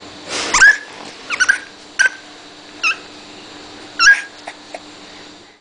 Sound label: orangutan laughing